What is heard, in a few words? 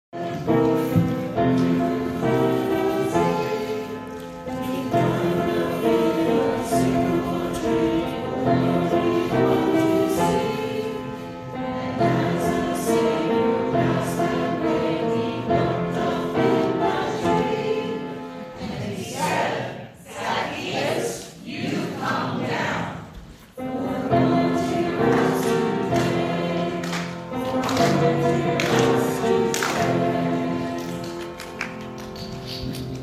Gospel Acclamation; Zacchaeus